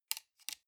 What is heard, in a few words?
sunglasses plastic side piece fold soft